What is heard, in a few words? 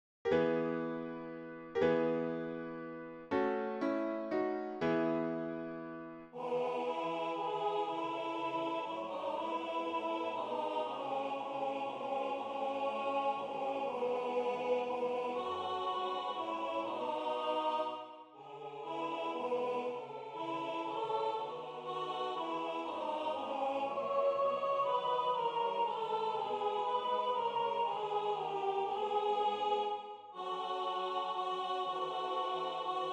alto; Shepherds Farewell; Waiheke Island Choral Society